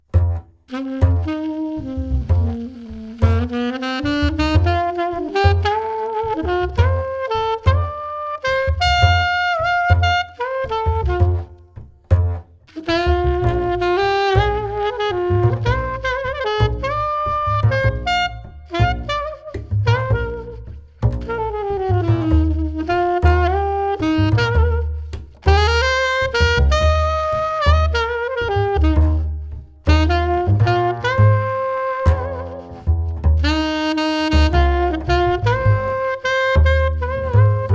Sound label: solo sax